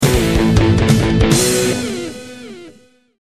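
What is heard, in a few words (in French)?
electric guitar; djingle de transition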